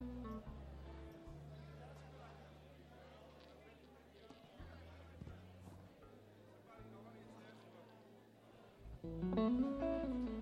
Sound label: tuning